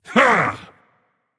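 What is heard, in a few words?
non verbal yay, voice; cernunnos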